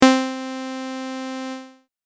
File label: decay